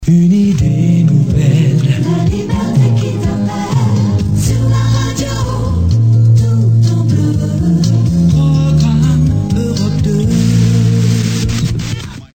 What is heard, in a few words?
Jingle